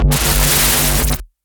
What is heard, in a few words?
Storing Mix